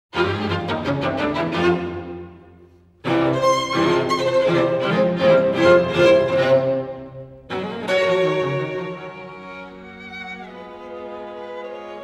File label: String Quartet in F Minor, Allegro; String Quartet No. 11; Op. 95; Quartetto serioso; Ludwig van Beethoven; Beethoven - String Quartets Nos. 1-16